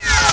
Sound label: tracer